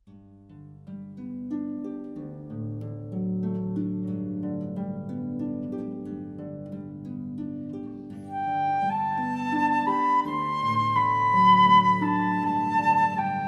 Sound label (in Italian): arpa flauto violino; matrimoni